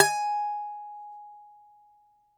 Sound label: DOMRA, Russian Strings